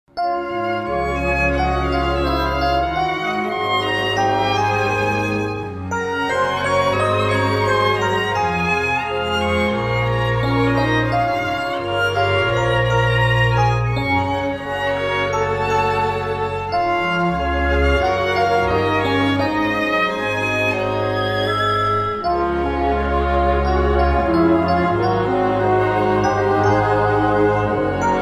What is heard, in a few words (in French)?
instrumental